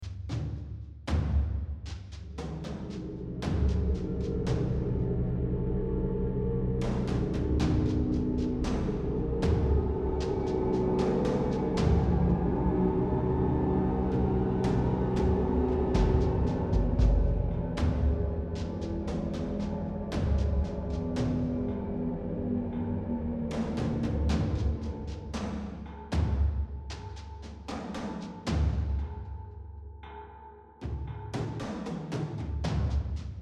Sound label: Tension; AB Tension 2 Block Sudden Death